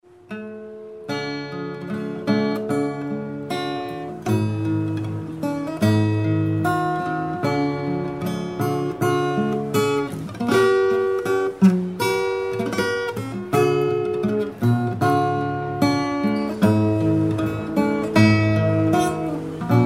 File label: Lisu folk music; ki song 4